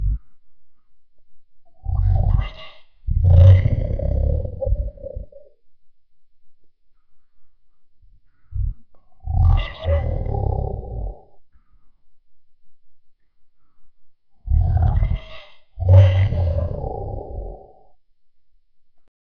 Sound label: the whisper of a fantasti, bat